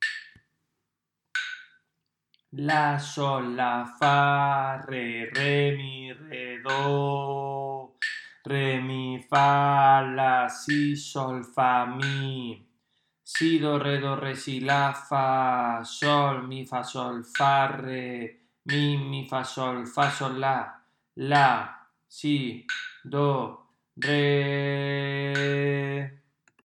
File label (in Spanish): RITMO